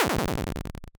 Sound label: die; Shattered Sword